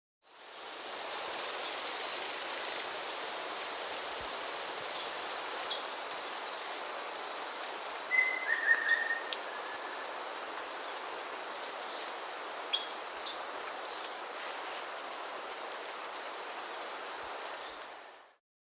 Ocellated Piculet (Picumnus Cirrhatus Dorbygnianus), Andean Birds; S. America